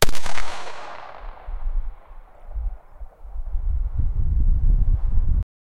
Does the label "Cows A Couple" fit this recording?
No